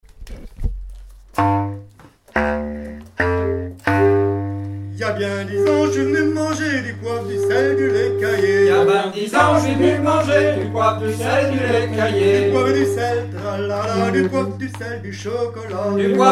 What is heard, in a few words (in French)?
clarinette; rond loudeac baleu stagiaires ensemble